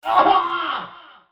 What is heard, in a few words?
scream